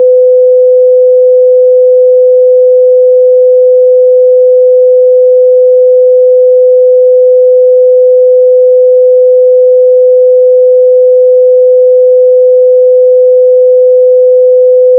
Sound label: simple 1 tone alarm siren